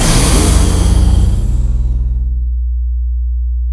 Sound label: dispenser heal; tf2